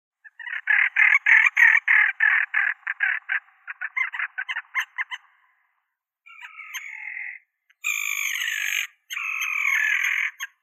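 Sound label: common tern, Sterna hirundo; krachka rechnaya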